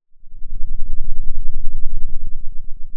Sound of a thunderstorm, center

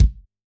Kick, drum

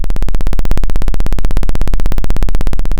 Sound of a plane taking off

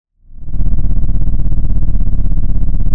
Sound of an engine revving

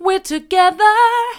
we're together mid, vocal

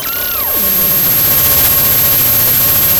Sound of a cat meowing

